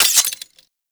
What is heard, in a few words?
Quill Dart Gun Ice Mech